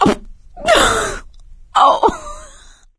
laughter, survivor, voice

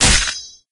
cleaver hit; tf

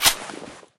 pistol slide forward; Left 4 Dead; Source Engine

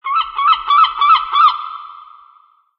seagull; Source Engine; tf; mvm warehouse

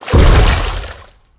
tank walk water, footsteps; player; source engine; left 4 dead 2

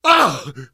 hurt critical, survivor voice

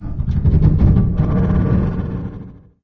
lift creak; video games; ambient; source engine; portal2; outland

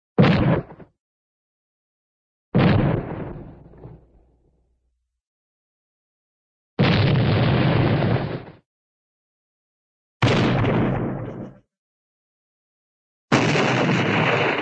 explosion short explosion; cartoon

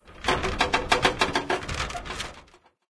random metal rattle

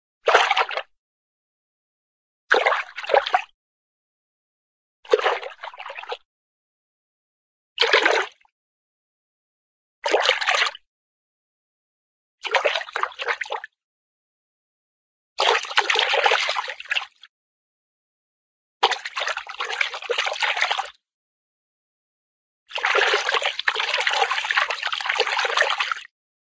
water, splash fish splash, cartoon

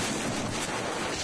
flesh scrape rough loop, physics; Source Engine; video game

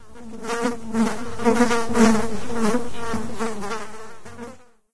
fly buzz, random animals; ambient; Left 4 Dead 2; Source Engine

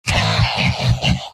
laugh short; heavy; source engine; tf; mvm

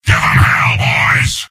soldier battle cry; mvm

